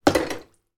household fork drop into washing up bowl of water and dishes